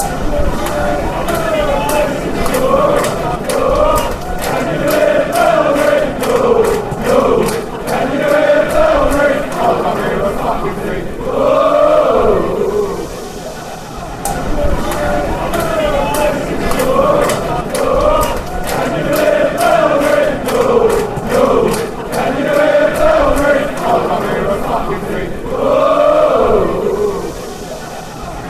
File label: can you hear bell ring, chants